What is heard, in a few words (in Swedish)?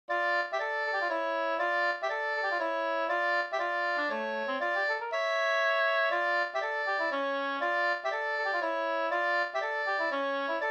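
polska, tvåstämmig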